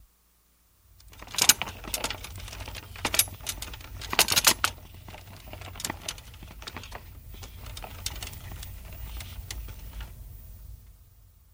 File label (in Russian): Звук инвалидного кресла wheelchair